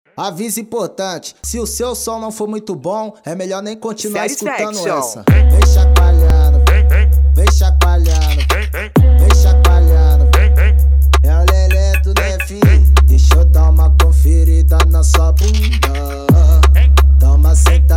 som automotivo; rebaixados

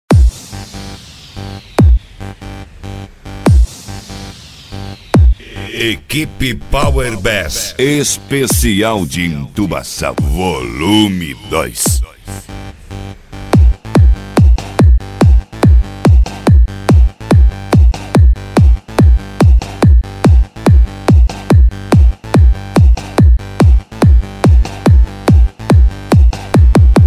entubacao

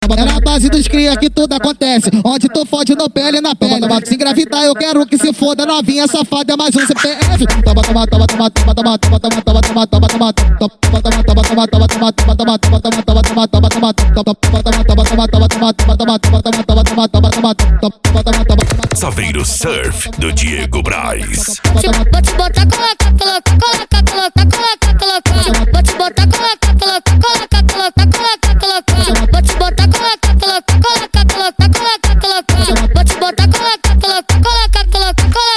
funk consciente e funk ritmado

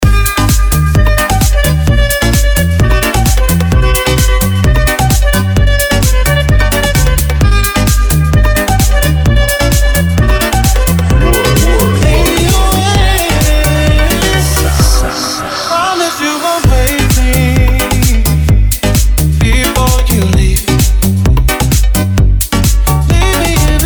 SOM AUTOMOTIVO REMIX

